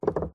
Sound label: Wood Stress Creak Squeaks; DPA4060; pmsfx